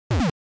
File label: NES Fighting Hit